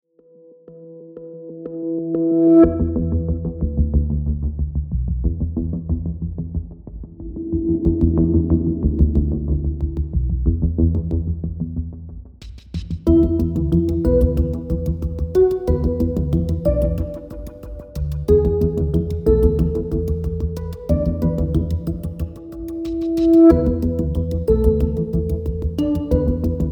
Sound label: Digital Meditation